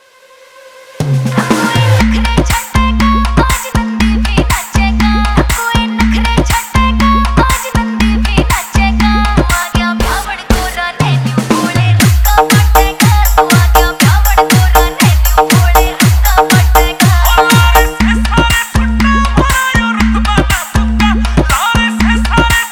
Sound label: Bolbam Song 3D Bass Remix